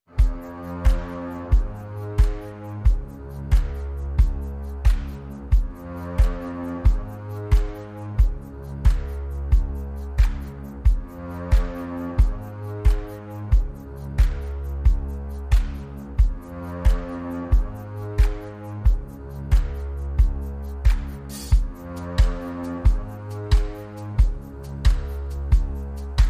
Drums and Bass Mix

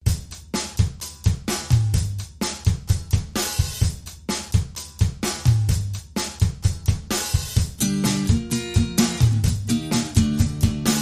Instrumental

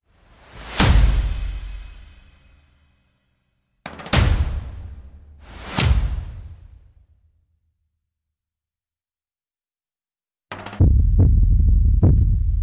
Percussion Only

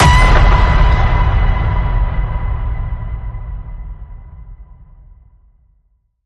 Ping It Loud, B

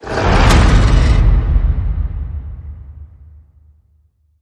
Toyland Growl Hit

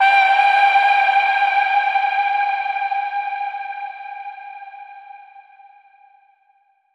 Expanse Distant Ping

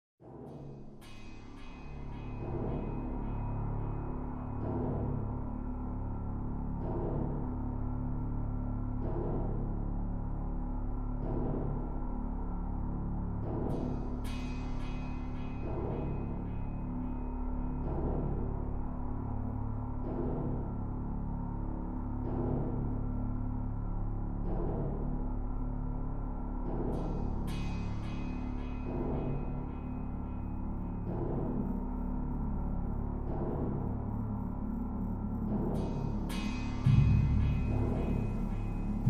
Drones

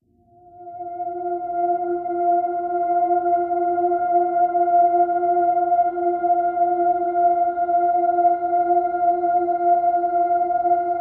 Harmonics F

